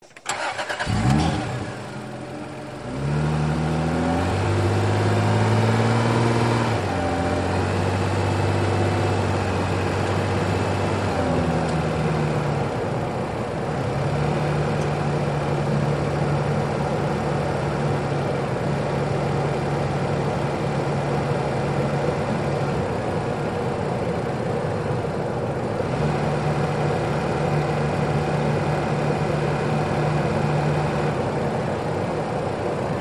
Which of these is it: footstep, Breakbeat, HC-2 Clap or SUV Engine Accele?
SUV Engine Accele